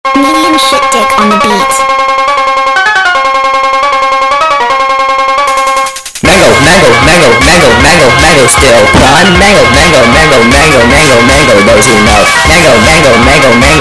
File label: Phonk sped Up